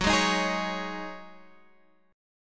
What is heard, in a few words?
Db Suspended 2nd Suspended 4th Gb, chord